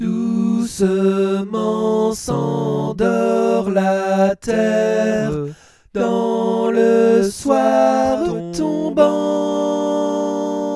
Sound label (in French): berceuse cosaque; ne sors pas anna